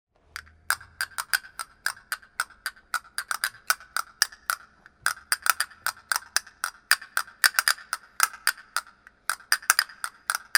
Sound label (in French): castagnettes